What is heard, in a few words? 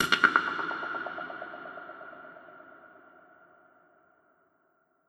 FX Misc